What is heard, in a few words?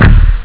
the bass; ST-XX Amiga Soundtracker Sample Packs